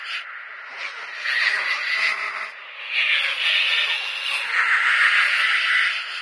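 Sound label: ambient devil baby; hellhole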